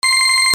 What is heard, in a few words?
BUZZERS